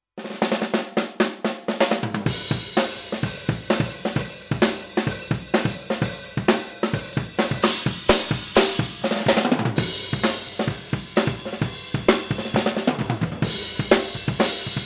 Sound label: solo